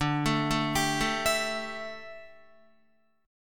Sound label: D Suspended 2nd Suspended 4th D down Guitar; arpeggiated